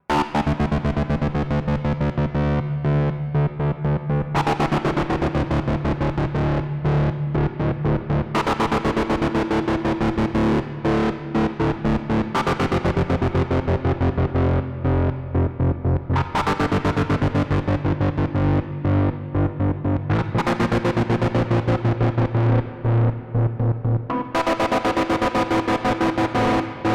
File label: Chop Tremolo; Line 6 HX Effects